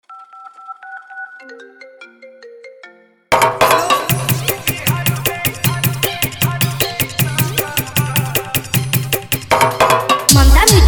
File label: New Bhojpuri Dj Remix Song